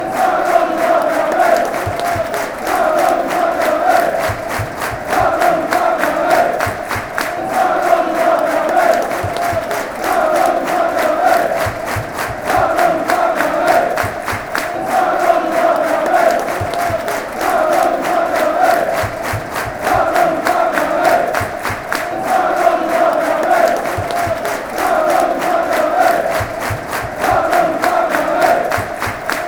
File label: barmy army, fan chants; giles